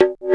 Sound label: CONGA, DRUMS; Analogon